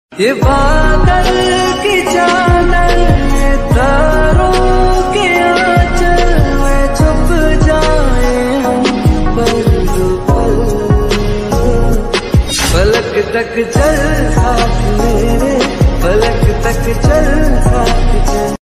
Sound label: ringtone love